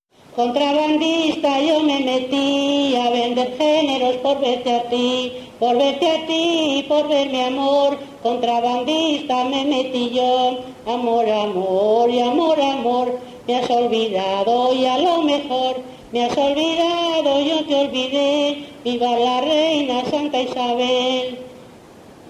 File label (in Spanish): Jota, Olmedo; Jota "Contrabandista yo me metí"